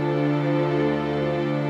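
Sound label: Pad D minor, Orchestral; Sonic Foundry (Sony Creative Software); Orchestral VOL-2; Pads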